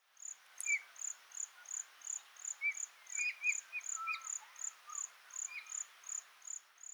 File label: Neumann's starling